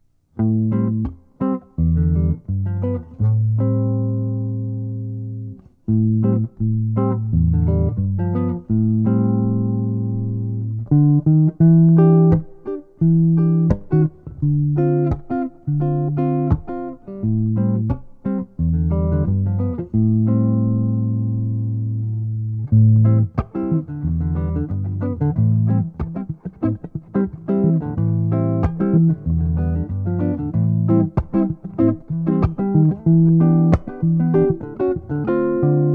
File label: guild x 700 sunburst, Guitars Archtop Electric & Acoustic; This last track demos the pickups